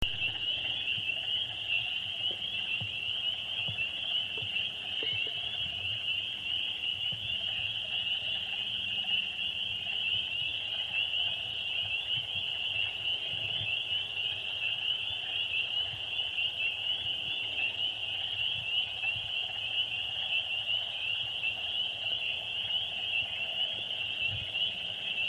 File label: Spring Peepers